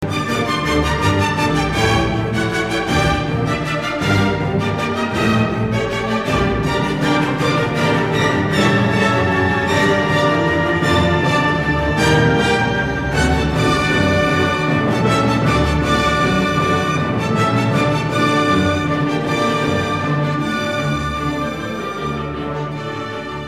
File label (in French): brahms concerto piano 1; szell